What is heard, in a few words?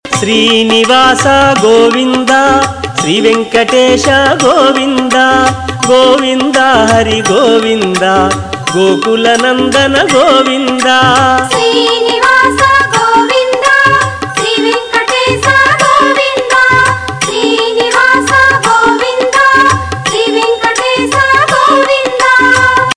Balagi mantram